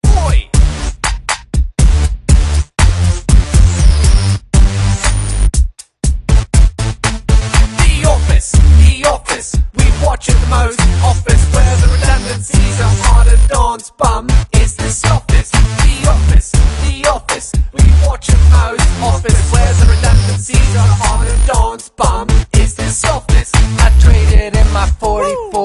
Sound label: rap; The Office